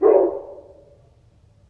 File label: distant, dog